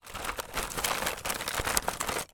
household clothes pegs wooden in plastic pot movement; zapsplat